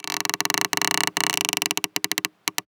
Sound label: forest tree wood texture creaking; Forest Textures; BluezoneCorp